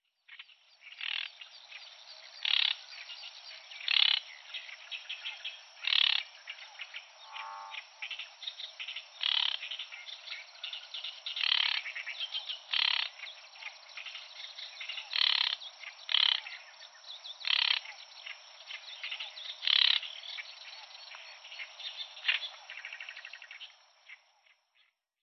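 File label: Oriental Reed Warbler; watarase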